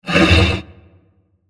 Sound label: Creepy Scratch Transition; Scary Soundscape; Horror; Crafted Signals